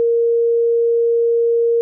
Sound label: sinus